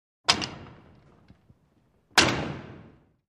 Metal Safe Door Shut With Latch. Small Heavy Metal Door